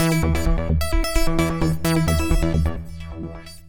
e chd machine, edm arp

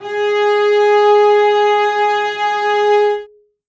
string acoustic, cello

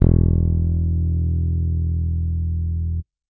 bass electronic, electric bass finger

